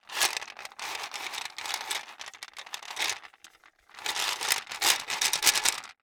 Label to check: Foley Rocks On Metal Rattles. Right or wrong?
Right